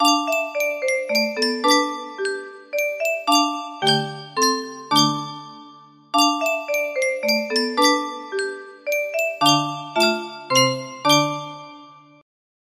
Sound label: lullaby version, music box melody